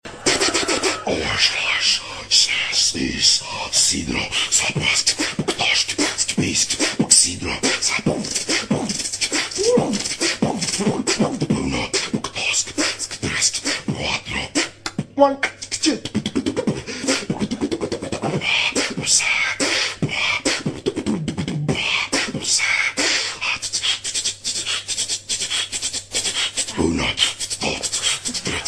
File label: Beatboxing